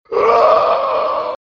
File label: weird laugh